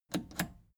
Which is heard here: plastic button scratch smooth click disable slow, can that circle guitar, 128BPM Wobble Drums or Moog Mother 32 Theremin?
plastic button scratch smooth click disable slow